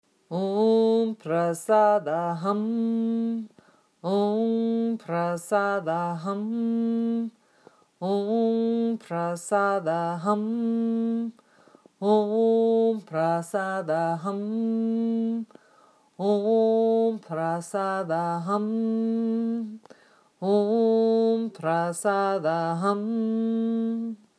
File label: om prasada hum